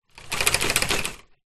transportation bicycle wire basket shake movement

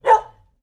animal dog english springer spaniel single bark

